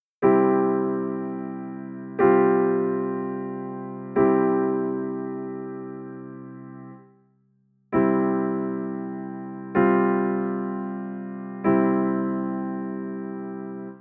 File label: The tonic pedal in C